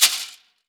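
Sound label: BRAZIL DBL, CABASA; Voice Crystal - Steve Reid Definitive Percussion; CABASA+GONZA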